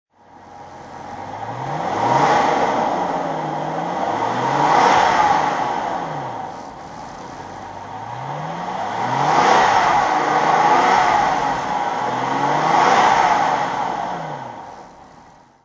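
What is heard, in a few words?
jaguar xjs v12 throttle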